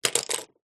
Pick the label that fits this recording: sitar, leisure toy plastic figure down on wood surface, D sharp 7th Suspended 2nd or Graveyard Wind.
leisure toy plastic figure down on wood surface